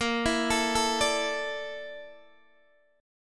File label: Bb Major 7th Flat 5th Bb Guitar Open D